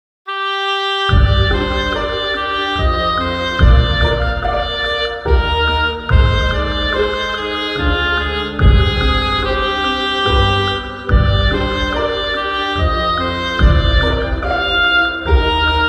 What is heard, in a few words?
forest guardian